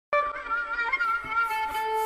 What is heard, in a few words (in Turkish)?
zilsesi